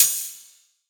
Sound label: soft hit normal